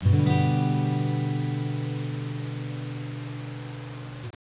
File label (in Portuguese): guitarra